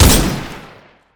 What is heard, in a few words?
shoot; EMXArms; mg90